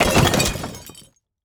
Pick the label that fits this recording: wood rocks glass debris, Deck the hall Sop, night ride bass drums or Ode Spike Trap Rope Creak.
wood rocks glass debris